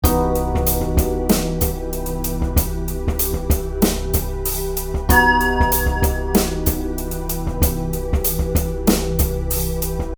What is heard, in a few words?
Microtonal